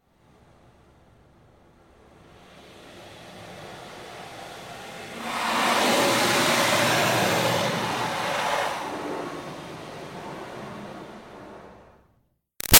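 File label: Water Spray Interior Car By Soft Long; Car Wash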